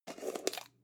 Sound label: jar plastic midsize open slow